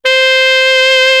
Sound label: M1 sax